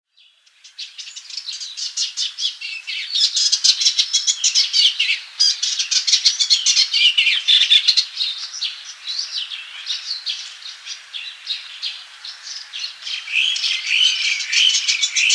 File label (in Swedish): Eksangare